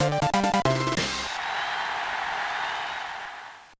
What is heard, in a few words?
Kirby Dance fanfare